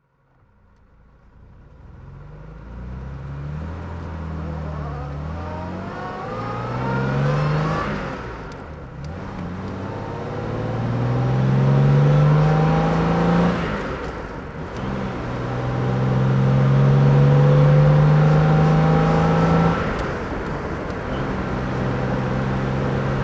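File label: jaguar xk150 34S driving, Engine sound driving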